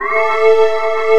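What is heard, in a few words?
ambient pad, synthesizer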